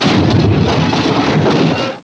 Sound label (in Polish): auto kraksa